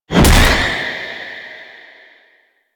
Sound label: Queens Chamber Shield Blast; Ambience; Warframe; The War Within